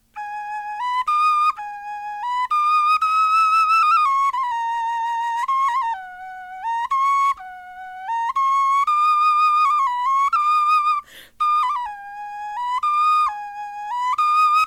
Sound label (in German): melodie